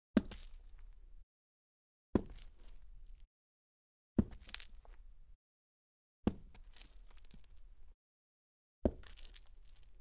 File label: Cement Gritty Dress Boots, Foley Footsteps; Sound Ideas